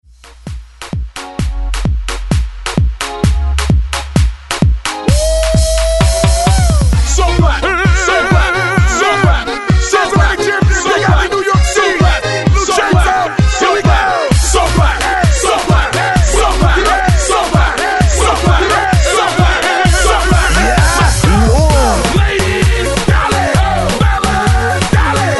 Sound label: Hype 130 Bpm; DJDOLLS